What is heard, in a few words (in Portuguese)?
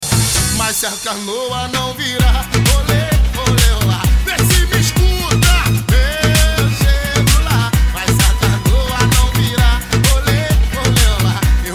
sound car carnaval